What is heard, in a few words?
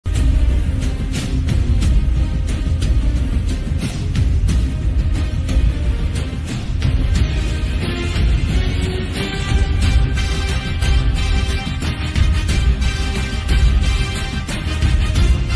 mass bgm ringtone; kanguva